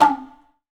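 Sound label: kotsuzumi; AKAI S6000 CD-ROM - Volume 5; TUZUMI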